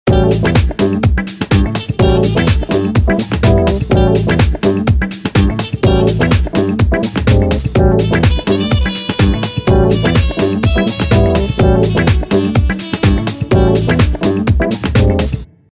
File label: Jazzy House Pleasure; Samsung X01SC Omnia PRO